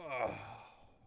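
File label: Swordsman Heal Vox; Civ4 warlords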